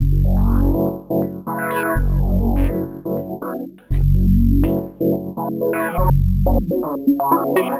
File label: Bunker F# 123 Filter; RoBKTA Sample Pack Supreme